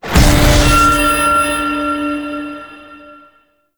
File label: Puzzle Button Timer Failed; Warframe; Duviri Murmur; Medusa Puzzle